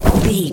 fireball projectile deflect

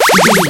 retro powerup item

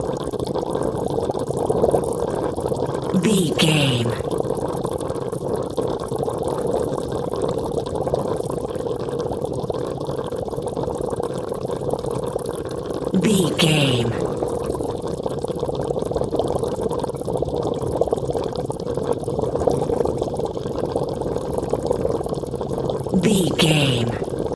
water bubbling loop

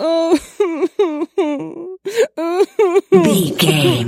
voice female cry

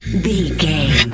wolf growl

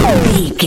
retro explosion general

